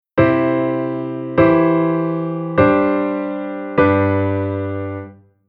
Chord

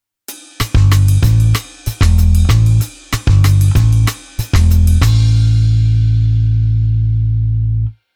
Bass Genre Basic

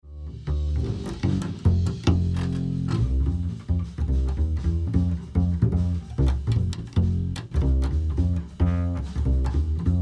Mix Bass Mic EQ On

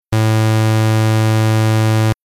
Synth Additive Other Wave

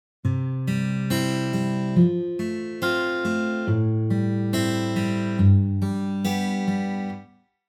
guitar arpeggio midi

